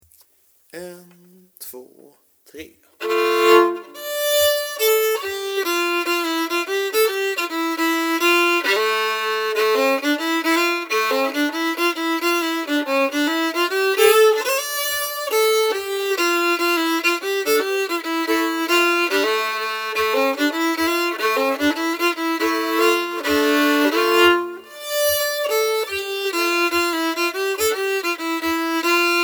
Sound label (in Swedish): Polska Stamma; fran Brotorp